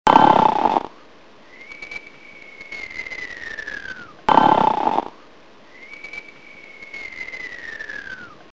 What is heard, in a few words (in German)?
schnarchen